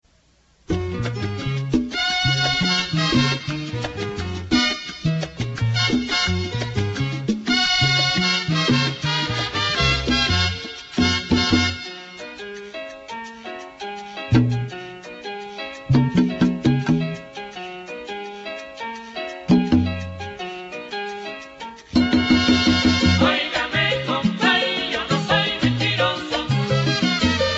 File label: salsa